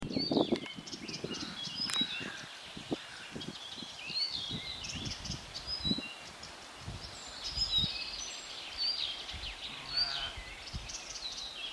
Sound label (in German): buschrohrsaenger